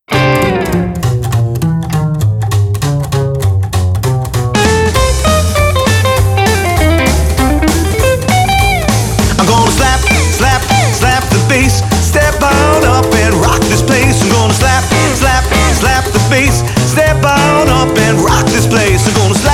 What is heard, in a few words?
rockabilly; Rockabilly Revival